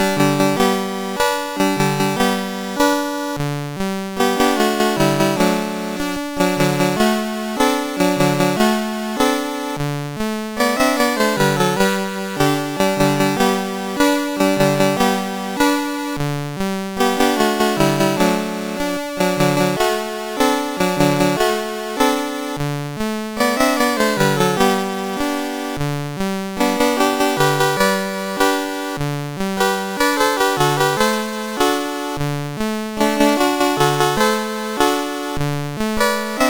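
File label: Pancho, MOS 6581 SID chip music, games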